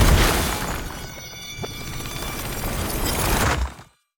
weapon cognitive cores; Stellaris; grand archive; weapons

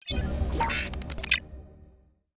asset acquired negative; Stellaris; nemesis

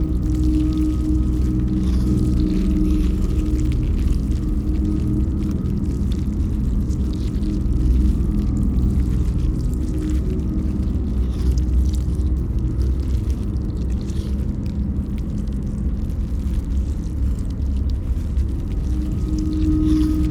ant ship starbase idle; biogenesis

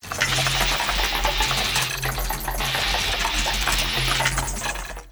event administrative work; Stellaris

